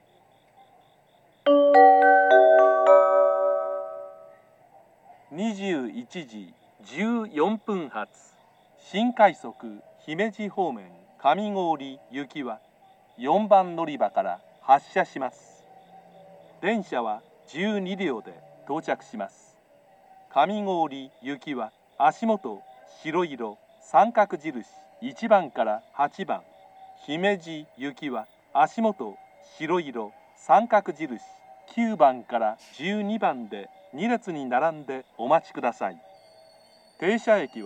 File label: special rapid service; ashiya; kamigori